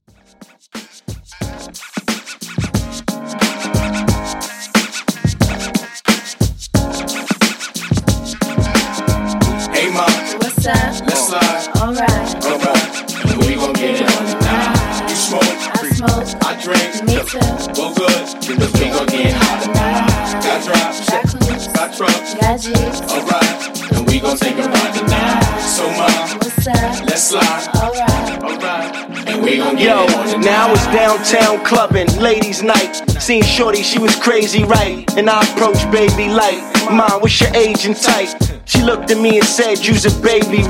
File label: Old School, 90 Bpm; Redrum